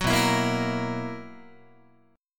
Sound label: B Major 7th Suspended 4th B, Guitar; strummed